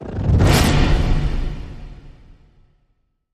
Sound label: Planetary Explosion Whoosh